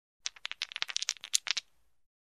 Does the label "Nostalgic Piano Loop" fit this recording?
No